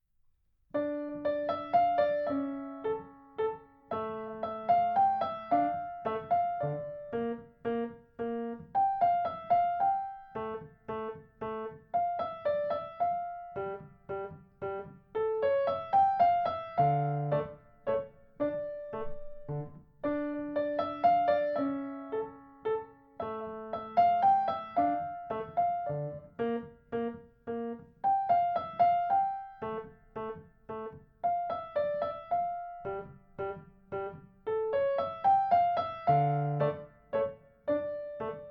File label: Minuet in D Minor